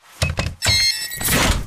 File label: atlas eject pilot foley and alarm nuclear core; Titanfall 2